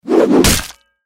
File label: Battle Axe Throw Impact Human Body; Warfare; Axes (Sound Effects:Tools); Blastwave